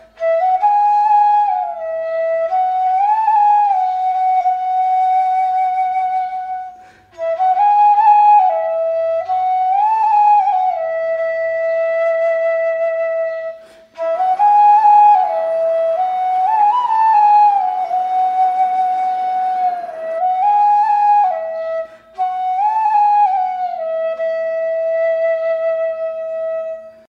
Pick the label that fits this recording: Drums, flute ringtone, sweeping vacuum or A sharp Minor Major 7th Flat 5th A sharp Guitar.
flute ringtone